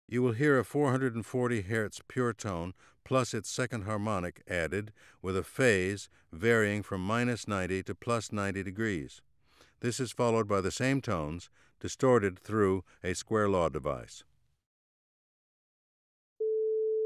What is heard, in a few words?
Distortion; ASA auditory demonstrations